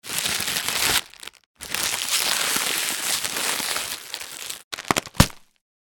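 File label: bag of chips opened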